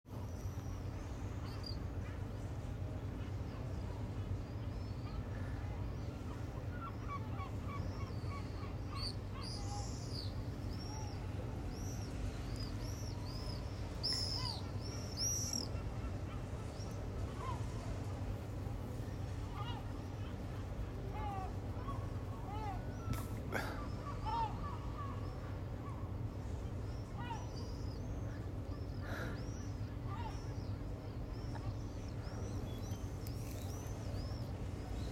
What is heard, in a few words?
Croatia Terrace birds, common swifts; Split Croatia